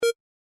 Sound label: click